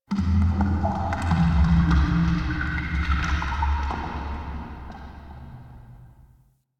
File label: Drips, Asteroid; Ambience; Warframe; Grineer Asteroid